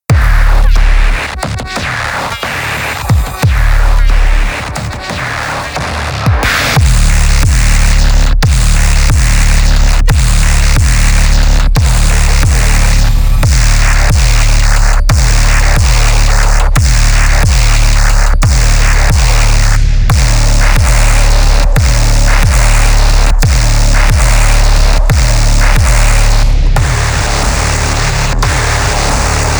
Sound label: Main Light; Blood Harvester - Main Light 09; Doom Eternal; Xbox Series X/S